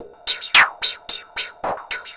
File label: NRG Percussion, Trance